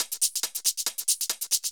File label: Loop 139BPM, Drums & Perc; G#minor